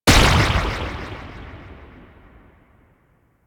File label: Golden Band Staff Slam C; Warframe; Monkey King